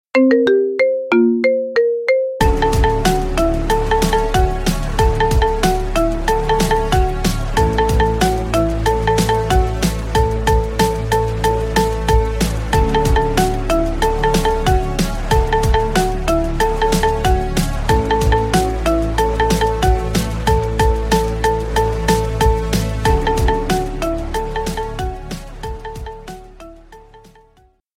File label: marimba remix; Рингтоны Без Слов